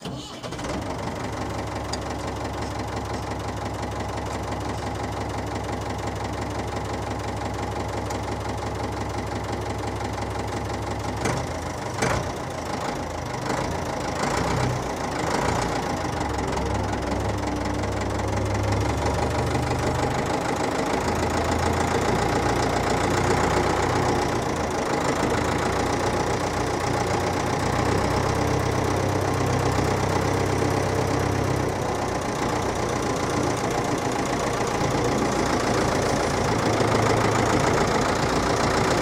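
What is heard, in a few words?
Forklift Start Idle Maneuver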